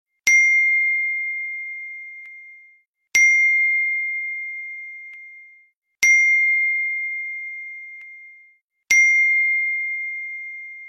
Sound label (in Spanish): Whatsapp 2019, tono de llamada